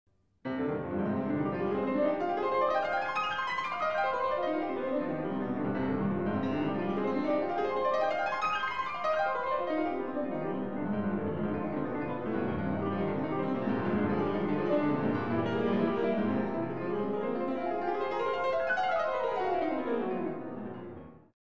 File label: ants; AnimalAntics